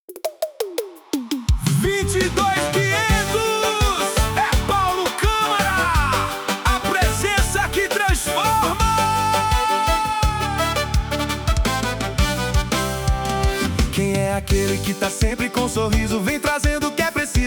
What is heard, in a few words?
Jingle